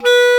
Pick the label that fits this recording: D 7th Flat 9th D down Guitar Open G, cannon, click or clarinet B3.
clarinet B3